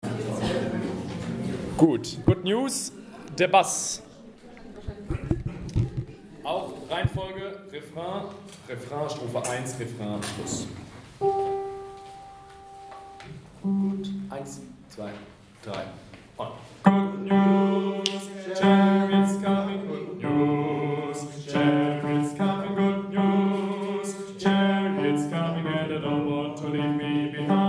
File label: Bass; Good News